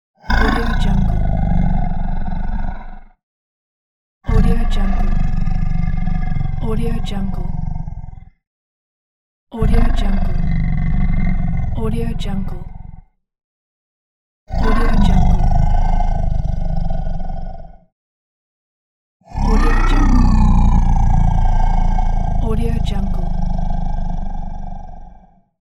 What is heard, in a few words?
monster roar